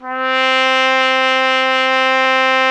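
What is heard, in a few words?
BRASS TRUMPET C 2; Roland LA; ORCHESTRA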